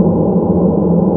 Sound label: ship out; allegiance